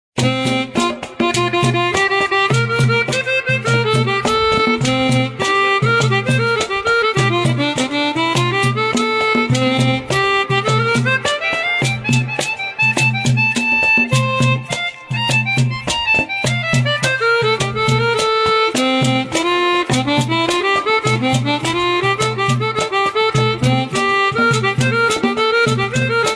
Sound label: loop samba